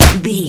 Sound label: kick heavy impact